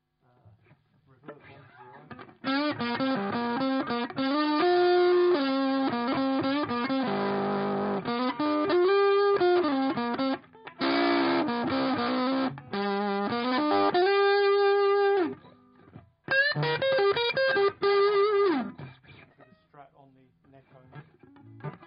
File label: lead mb then n